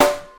natural steel snare drum d key

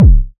tight low end kick sound D key

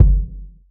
wet steel kick drum g key

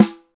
nice acoustic snare g sharp key; snare drum samples

